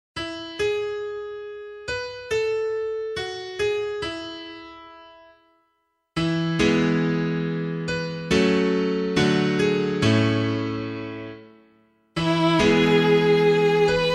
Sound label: Psalm piano